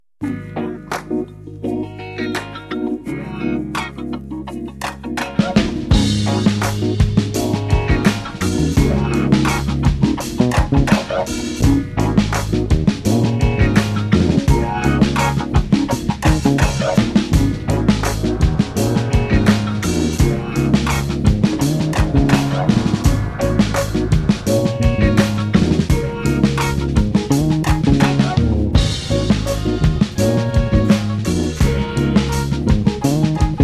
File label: Instrumental